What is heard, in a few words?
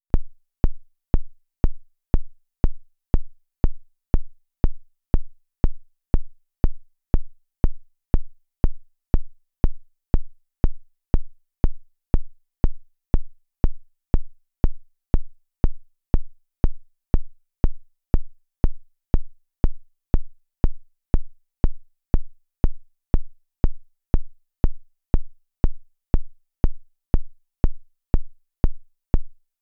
10hz hp